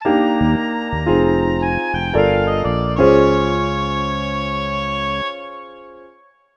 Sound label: Bari Sax